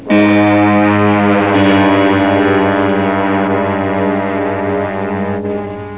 stress; invaders

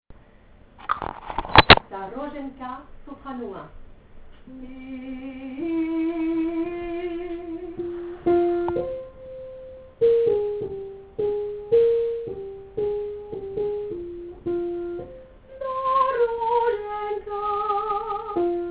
Soprano

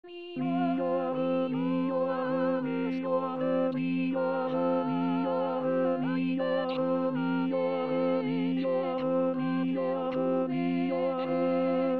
Tenor

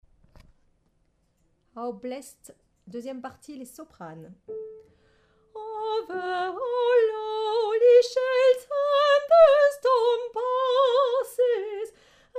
Soprano

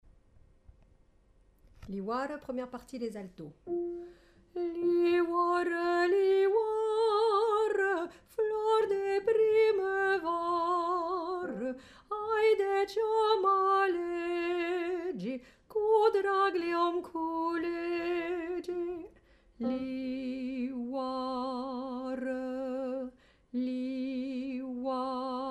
Alto

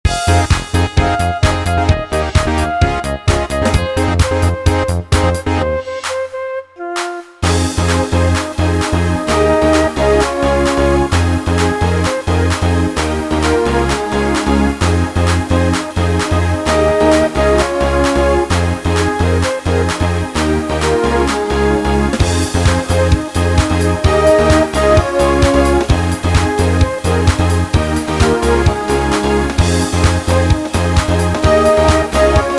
midi sequences

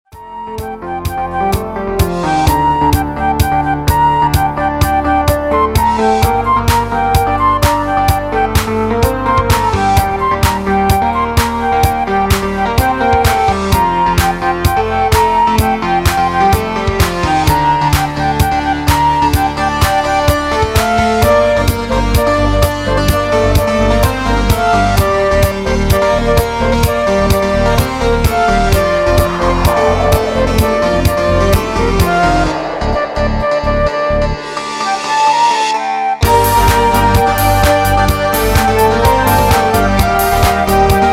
Scream, midi sequences

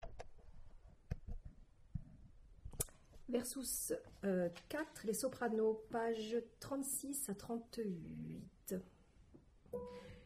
Soprano

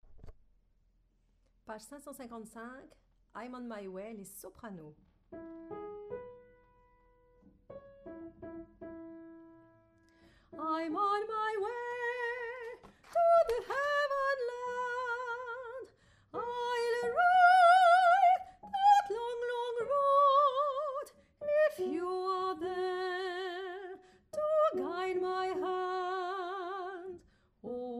Soprano

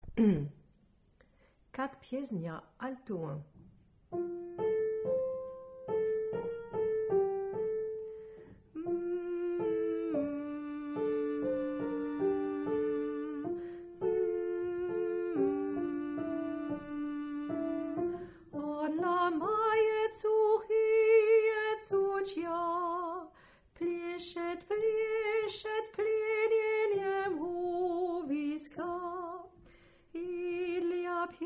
Alto

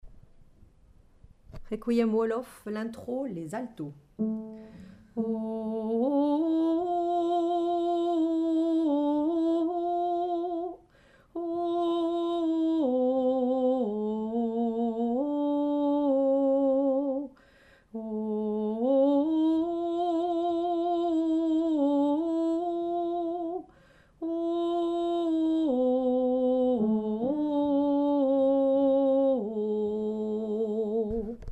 Alto; ouolof; So Vocal

